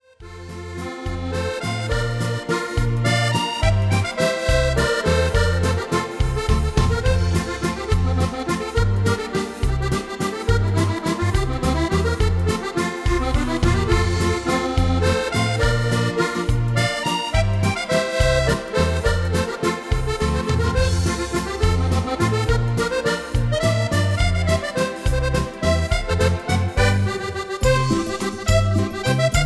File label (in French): musette